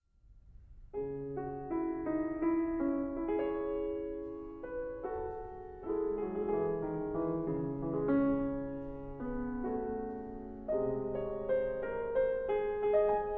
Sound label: Prelude and Fugue C Sharp Minor BWV 849 Prelude